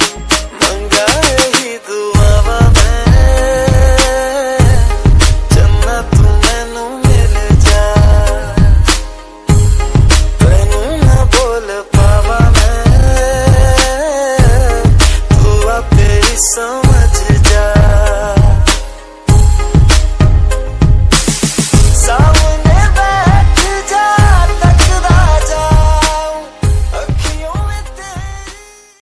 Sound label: bollywood ringtone